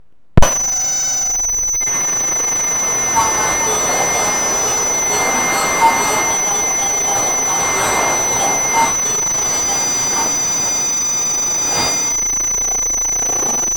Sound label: modulation subliminal